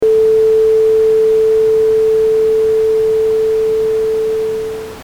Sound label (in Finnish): kompandointi